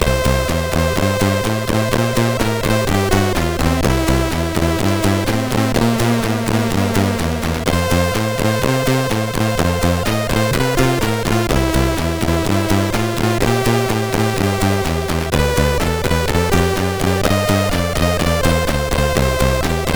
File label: Blues CSG8580R5